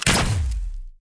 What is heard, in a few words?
tower rocket Gunners phosphoric Coating